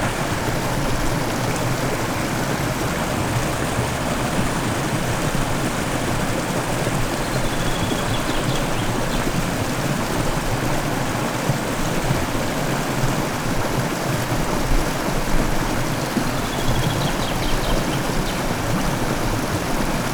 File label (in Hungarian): vízesés, halk madár, patak; visegrad